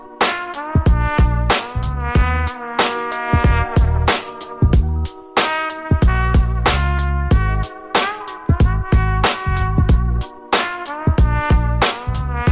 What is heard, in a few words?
ringtone instrumental ringtone; english ringtones